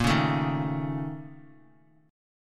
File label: Bb Minor Major 7th Double Flat 5th, Guitar; x,1,1,2,3,x; BbmM7bb5 strummed; JGuitar